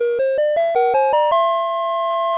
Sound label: Checklist Complete; dewinTheme; habitica